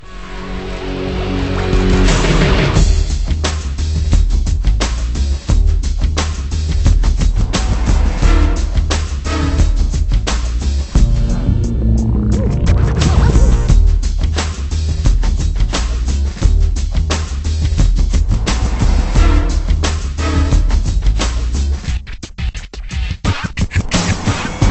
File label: Menu Loop; tiger woods 99 pga tour golf ps1 windows gamerip 1998